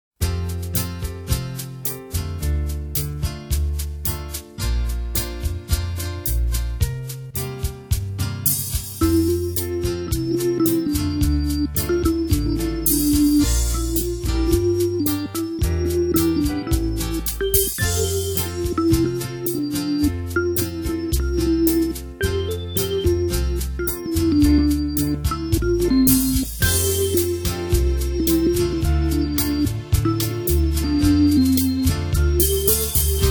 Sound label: instrumental